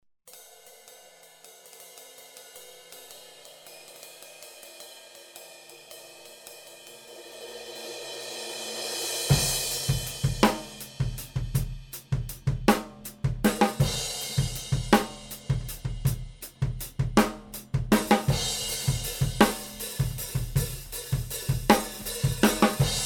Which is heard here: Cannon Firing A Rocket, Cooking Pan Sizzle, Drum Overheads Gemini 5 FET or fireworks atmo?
Drum Overheads Gemini 5 FET